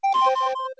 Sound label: Stop Recording; Samsung SC-01B